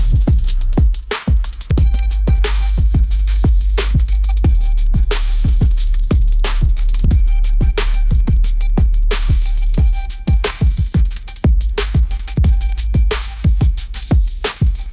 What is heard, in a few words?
urban swagger pop groove, ringtones; ai-generated